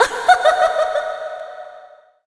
Great Fairy Laugh; Great Fairy laughing

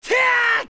Fire; Adult Link; Link casting Din's Fire

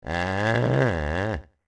Moan; Carpentry Apprentice moaning; Zelda

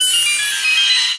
Transform; Transforming into Sheik (jingle); Zelda

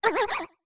Gasp; Deku Link's gasps after a big jump

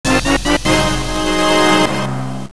Fanfare Item; Zelda; MC

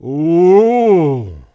"Oohh"; Lenzo; Zelda

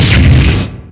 Hit Kick

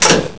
Armos Switch; Hitting Armos's power switch

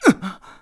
Wall Hit; Link rolling into a wall (2 hitting sounds, 2 recovery sounds)

